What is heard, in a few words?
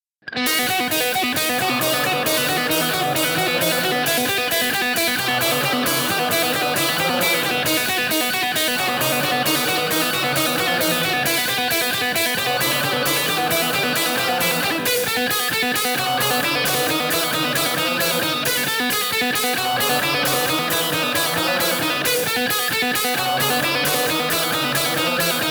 Ringtone